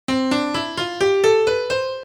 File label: doremi